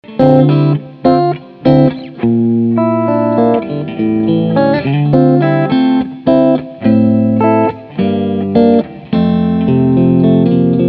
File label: Cleanchannel Tone VOLL